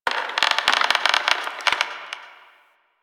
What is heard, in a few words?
Tree Creak; Warframe; Quests; TheNewWar; Ambience; Drifter Camp